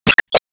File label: Ball Hit Drive